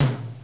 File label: tom